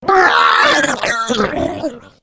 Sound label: female boomer vomit; voice; Left 4 Dead 2